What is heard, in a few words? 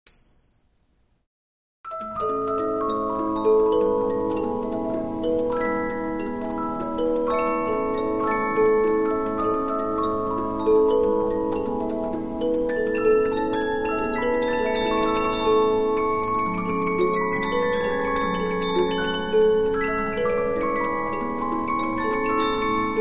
Polyphon, 15inch disc musicbox